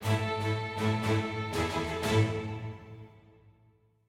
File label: heroic med end